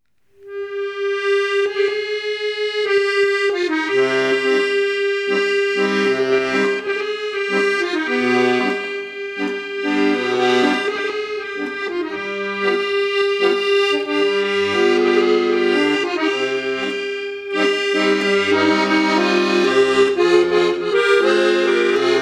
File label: Tango